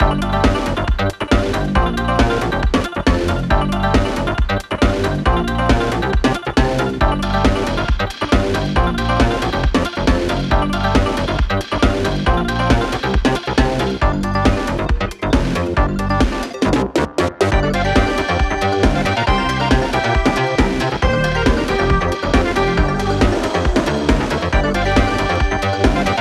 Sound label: 137 bpm; fashion police squad beta